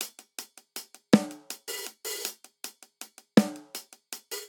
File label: Straight Six Rock Snare & Hihat 6/8 80 bpm; Ableton; Drum Booth